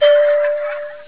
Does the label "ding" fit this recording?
Yes